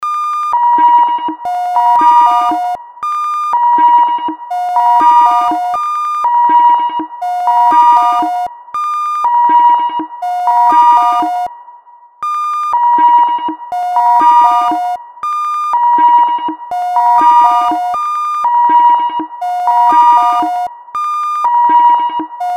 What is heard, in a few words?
sine telephone jingle